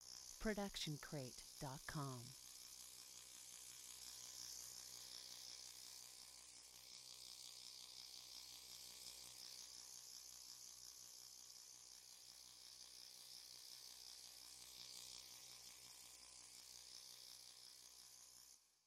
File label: hovering drone; soundscrate